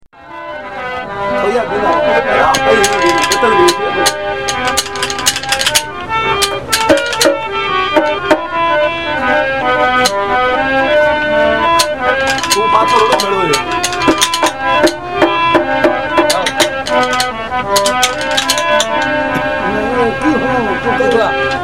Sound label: home sufi camel song, Field Recordings from India; WFMU